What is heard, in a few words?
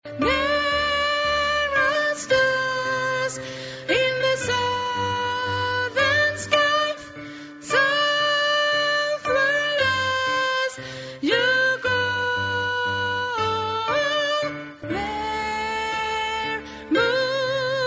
tenor